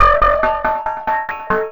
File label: TECHNO EXTRA 140